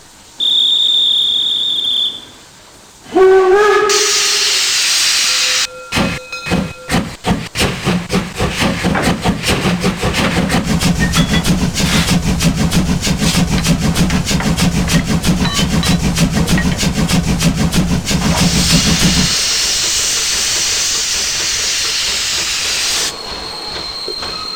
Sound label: BR 50 Sound; DB